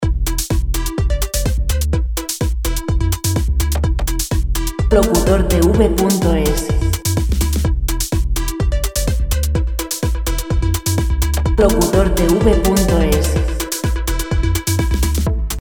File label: 126 dance musica; dance musica libre